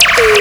ELECT FX; USB Soundscan vol.27; Ragga & Dub Reggae; AKAI

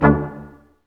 BRS Quintet stac; Roland L-CD702

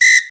whistle, Yamaha TG-500

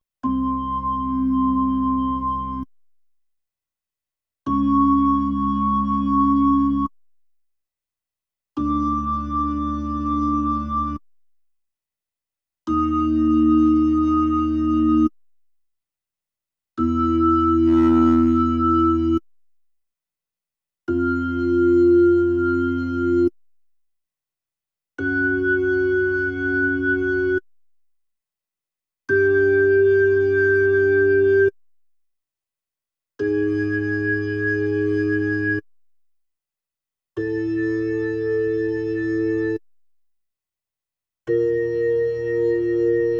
B3 Organ; Masterbits CLIMAX 8

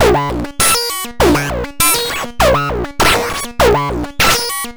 Modular Loop, 100BPM; musicradar; bitcrushed; Crushed Loops

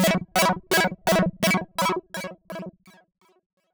reason syn; Synths and FX; neuro; Sinistarr

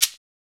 Maraca, Percussion; MARKYDRUMS

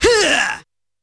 G#4 Huuaah!, Yamaha PSR-E323 Sound Effect Kit

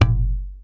WD R, DRM HITS; Spectrasonics; Liquid Grooves; WAVDRM HITS1

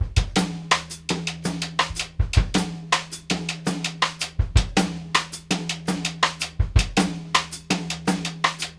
6 8 FEEL, Drumworks; Sampleheads; New York City Drumworks VOL-1

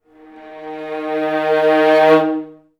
VA CRSC D#2, Advanced Orchestra; Best Service

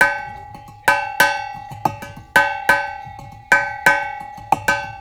Bell, Percussion Loops; Ambient Grooves

